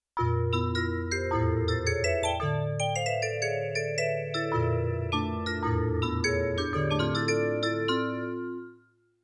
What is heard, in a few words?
Vibes; Yamaha TX16W; Jazz Performance; Jazz Performance 2